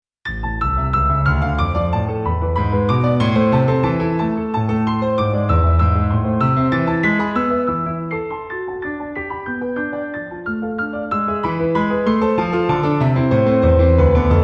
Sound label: Soft Pdl Grand; patch demo; 16-bit, 44.1 kHz stereo; xp-80; roland; roland demo cd-3